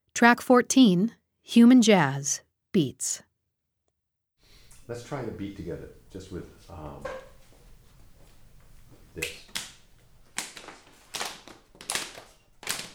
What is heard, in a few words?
Human Jazz Beats; Blind Youth Audio Project 2016